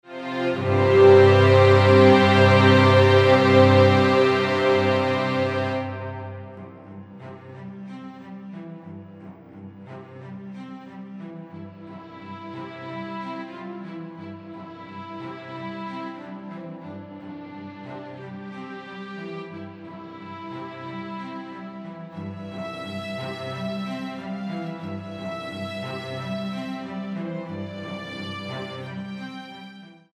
Piano Forte String Quintet

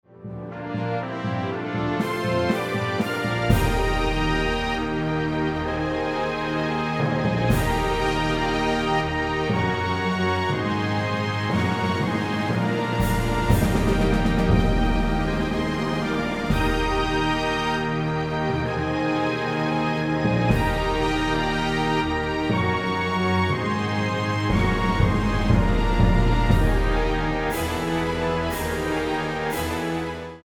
Fanfare

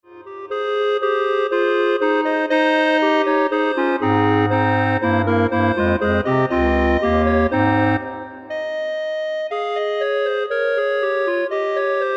Clarinet Ensemble

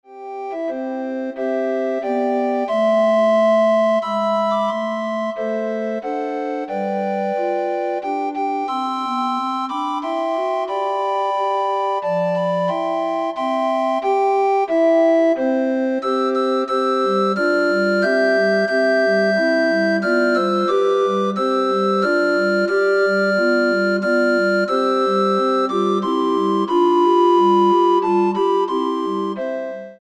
Recorder Ensemble

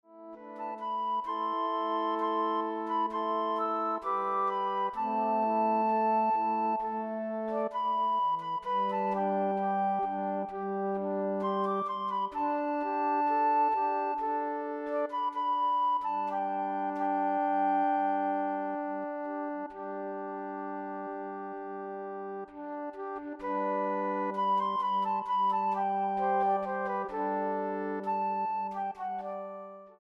Flute Ensemble

